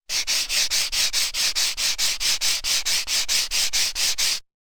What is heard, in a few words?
rub